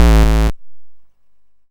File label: YM Kick, DRUMS; KICKS; ChipShop Deluxe; ChipShop Hits